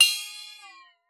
WATER, PERC; Xsample VOL-9

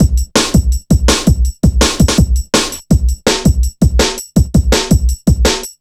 billy whizz, Drum Bass Drumloops 165bpm; Zero-G; Total Drum Bass

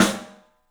STEEL S, drums; 3D Sound

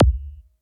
MKS80 BD; DANCE KIT

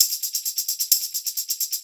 130 TAMB, Percussion Loops; USB Soundscan vol.36; AKAI

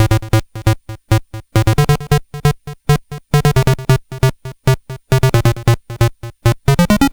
Game Boy Bass FX; Nintendo Game Boy

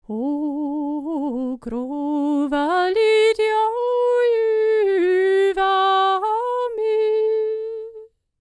Nord, World Voices; ILIO; Vocal Planet VOL-5

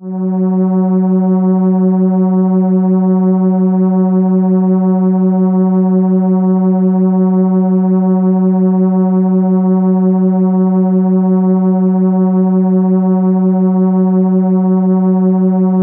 Cello F#4, ARP Solina

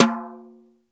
SLAP, BRUSH KITS; Crypton - DrumBase5000

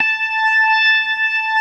STOPS, organ combos; Keith Emerson Signature Series

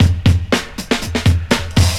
punch

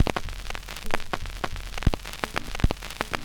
Vinyl, noise; layering

